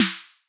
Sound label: short snappy snare hit